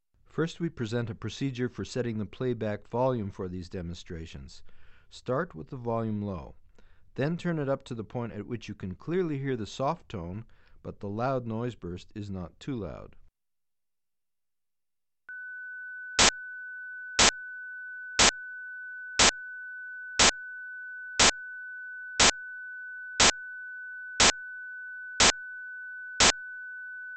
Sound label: Loudness Calibration Pattern For Playback Volume; Bregman ASA; auditory demonstrations